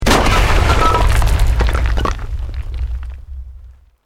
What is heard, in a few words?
EXPLOSION MEDIUM STONE ROCK